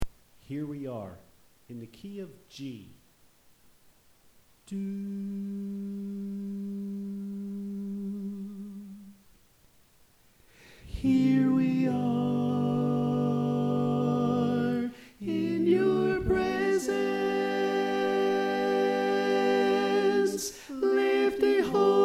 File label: Alto